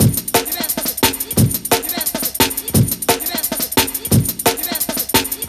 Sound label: 175, classic dnb breaks